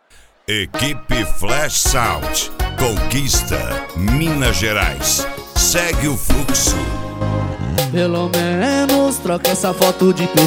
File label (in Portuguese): sertanejo; cd sertanejo flash sound